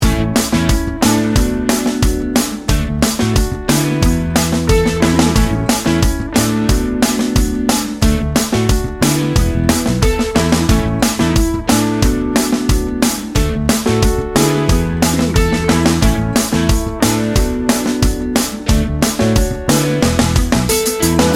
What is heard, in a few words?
teen pop loop